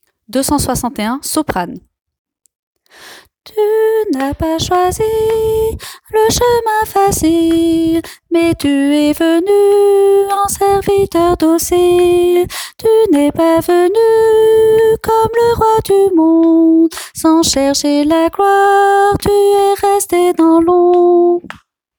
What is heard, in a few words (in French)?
soprano